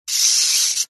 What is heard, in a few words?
household radiator heater portable timer dial turn set single; zapsplat